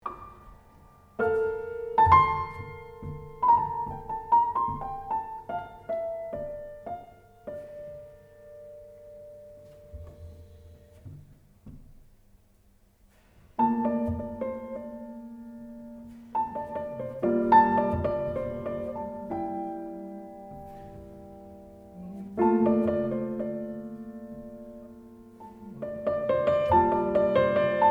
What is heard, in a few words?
Improvisation, piano